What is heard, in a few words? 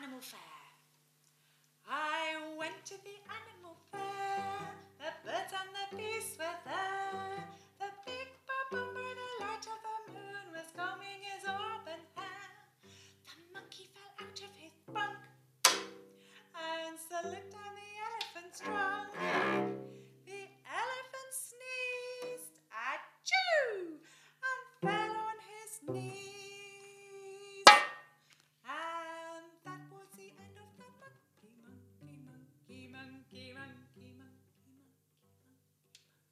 Animal Fair Vocal and Backing track